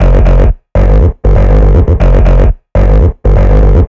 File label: Synth Bass; Basses